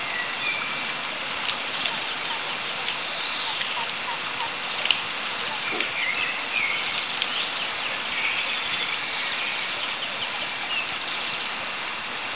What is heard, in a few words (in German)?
Brunnen im hofgarten; Veitshoechheim; Schloss